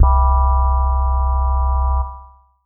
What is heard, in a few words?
Mod Organ C4